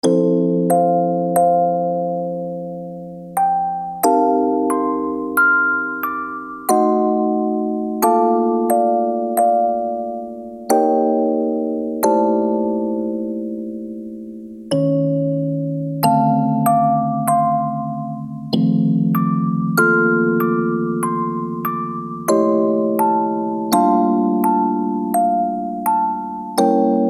music box; Akatonbo

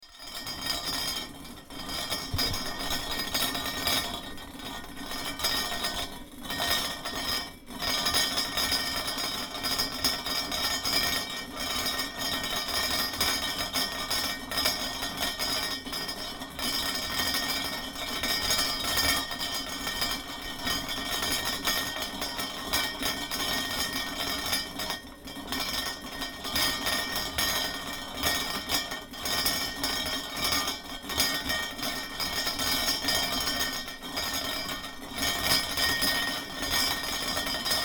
kettle

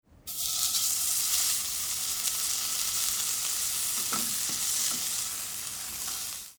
fillet steak

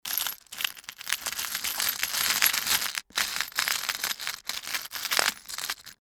tool box

